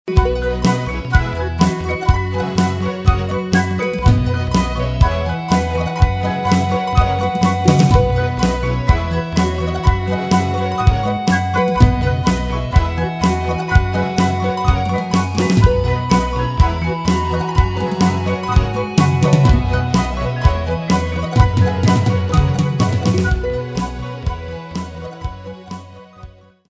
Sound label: Celebration Theme; Swords and Sandals Medieval